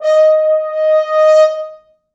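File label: French horns, four, d#4; East West; Quantum Leap Horns Sax; 4 FrHorns fts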